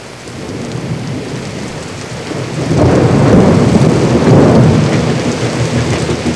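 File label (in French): tonnerre, bruits de la nature; sons nature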